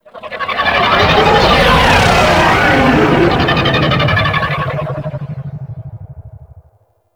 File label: Fly By Slow C; Vehicles; Flight; Warframe; WM Liset